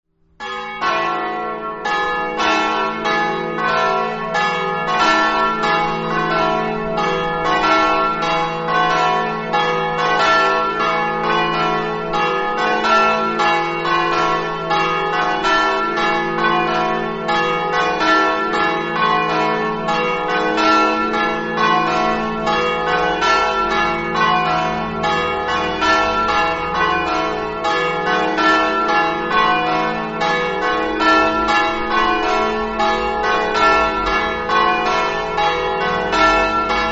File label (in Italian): clauiano, campane